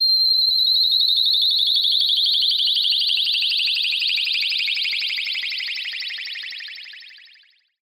Pointed Pulse

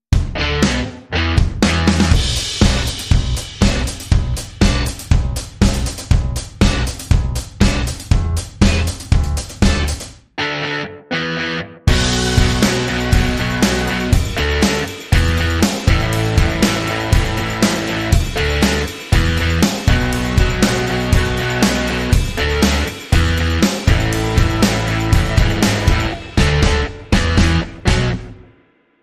Funky Fuzz Rock, No Lead Melody; 30sec

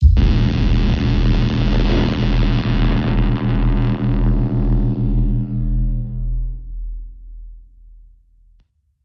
Sting Deadly Static

